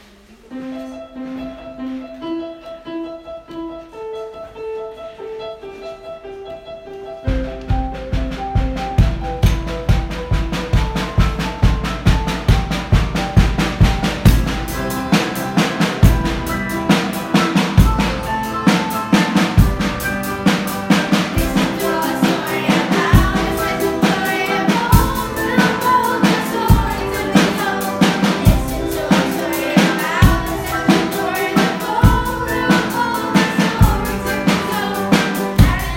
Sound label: House Music